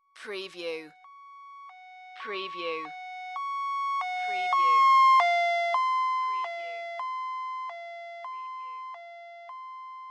Ambulance Siren Pass By; sound effects bible

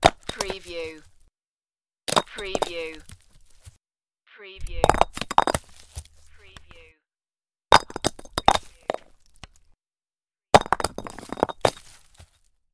Rock Fall And Tumble On Rocky Surface

